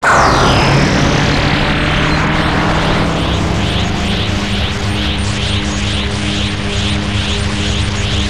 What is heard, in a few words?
death ball charge, weapons